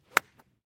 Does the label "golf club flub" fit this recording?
Yes